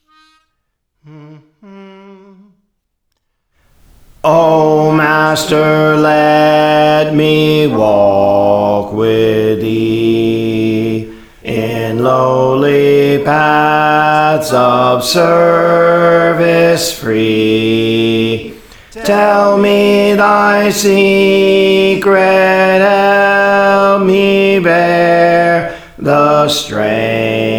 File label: Bass, Songs; Praise For The Lord Training